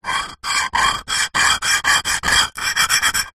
household broken pieces ceramic dish edges scrape

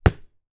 sport rugby ball bounce hard ground

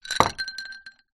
food drink glass ice liquid down table

